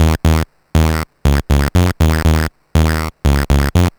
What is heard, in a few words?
Sh09 Bass Loop, 120BPM; musicradar; bitcrushed; Crushed Loops